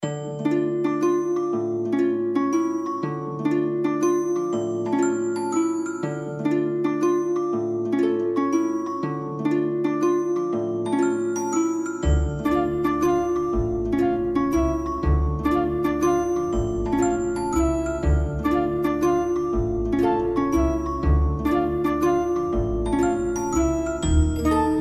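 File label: background music without words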